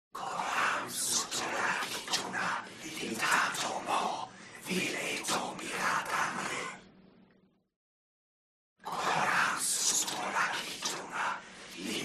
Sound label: HUMAN CROWD Evoke Whisper; smartsound